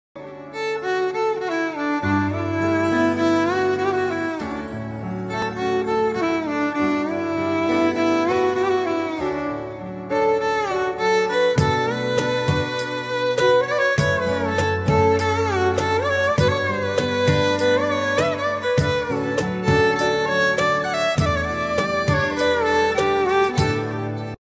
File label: mood feel music ringtone heart beat